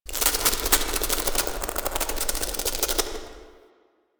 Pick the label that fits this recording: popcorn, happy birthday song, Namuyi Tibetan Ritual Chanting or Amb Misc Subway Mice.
Amb Misc Subway Mice